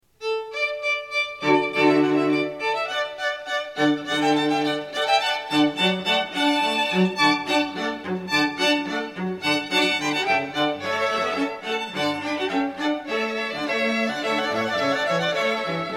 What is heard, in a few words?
strings; rejouissance